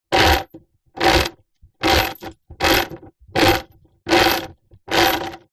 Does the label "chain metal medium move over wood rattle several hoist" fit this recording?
Yes